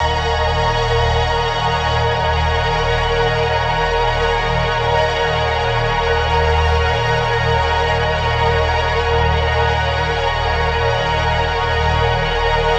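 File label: pad loop star filled night 150 D# minor; Virtual Riot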